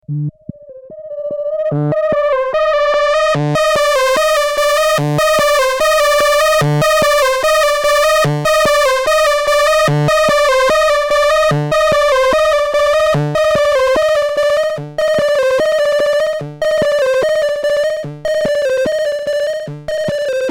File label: Lamda Drive